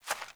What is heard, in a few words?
Hare Step; Encounters; Animal Rescue